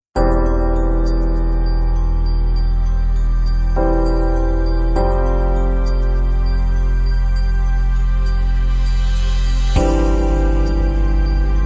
Inspirational Opening Ident, bpm100

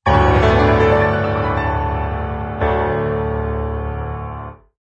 Piano Logo Reveal No violins

